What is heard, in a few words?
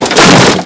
hit wood; WhiteOut; XBOX; trojan